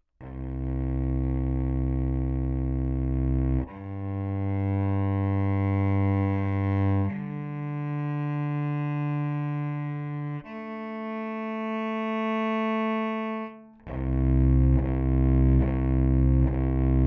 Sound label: Cello Pickup Baggs Presence